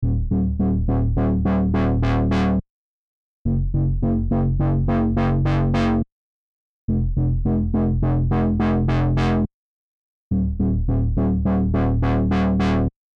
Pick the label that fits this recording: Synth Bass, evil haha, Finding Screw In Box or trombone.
Synth Bass